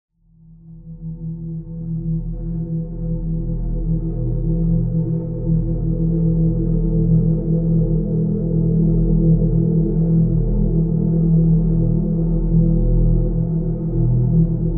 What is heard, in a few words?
horror ambiance ominous background music